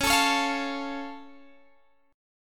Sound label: C sharp Augmented down, guitar chord